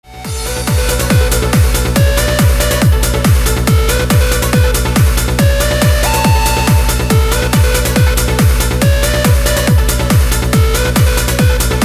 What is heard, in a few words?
trance mix